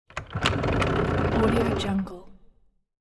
scooter start up

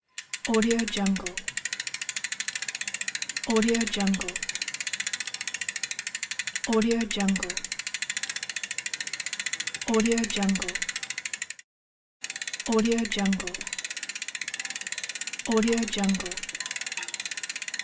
bicycle sound pack

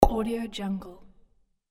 bubble pop

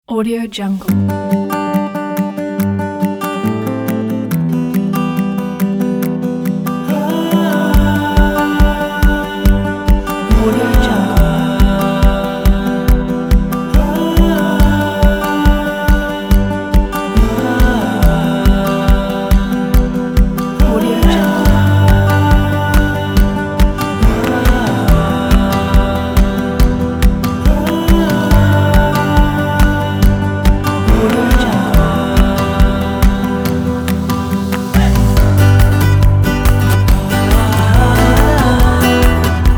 motivational upbeat indie folk

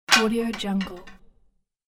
big wooden sticks falling

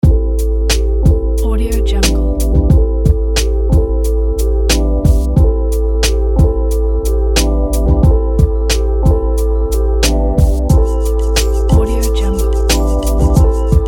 atmospheric trip hop loop

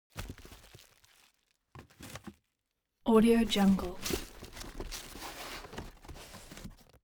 object box cardboard big unpack handle; smartsoundfx

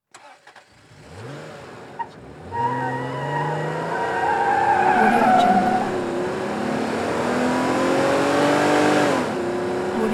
vehicle lr accelerate quickly skid

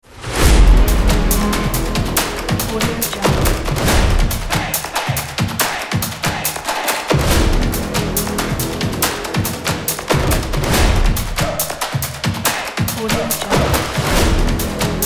the action drums

